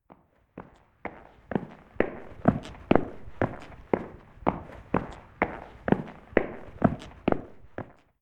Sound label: footsteps squeaky; Apotheorasis